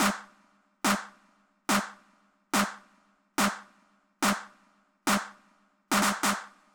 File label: Snare Clap, Club Techno, 142 BPM; Zero-G; Construction Kits